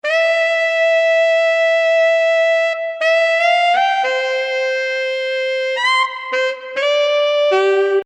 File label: Lead